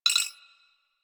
Hub Comp Beep; Warframe; Tenno Hub

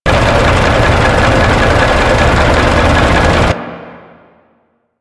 Shipyards Bg Machine Loop; Ambience; Props; Warframe; Grineer Shipyards

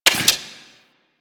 Fist Movement; Enemies; DE; Warframe; Tyl Regor; Fists

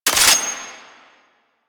Sniper Low Clip Mech; DE; Warframe; Warframes; Frumentarius; ExaltedSniper

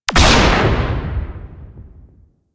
Modular Secondary Barrel Fire; Warframe; SU Modular Guns

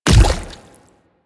Splitter Step Water, Footsteps; Warframe; Infested; Grey Strain

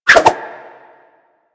Dagger Spin Whoosh; Warframe; Weapons; Dual Daggers

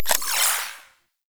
Camera Off; Warframe; Veilbreaker; Kahl

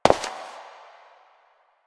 Step; Warframe; Koumei

